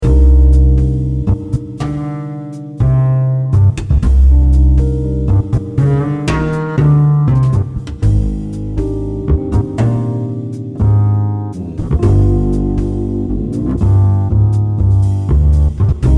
Upright Nylon String

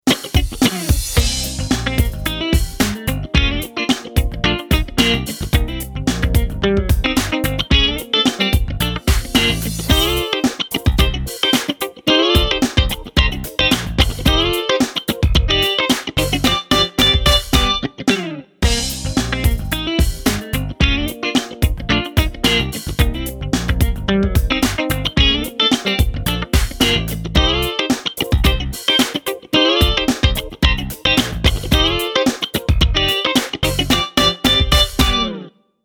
Funky Jazz Chorus, Jazz Guitar

